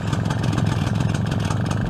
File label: kart engine idle; tf2ware ultimate; mariokart